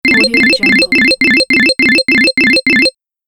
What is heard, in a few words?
tally score repeat